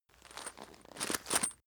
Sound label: chain mail movement